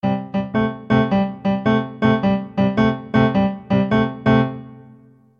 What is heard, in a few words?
Left hand C 2 bars, piano blues